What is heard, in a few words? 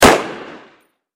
Loud Gunshot